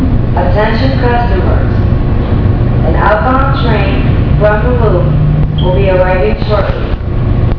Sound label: Outbound Train